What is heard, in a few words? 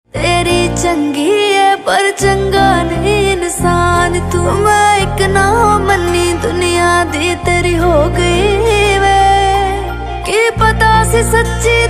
DJ Punjabi Ringtone